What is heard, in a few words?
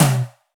Rock Tom, Roland BK-5